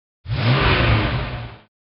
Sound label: Ferrari Rev; Cars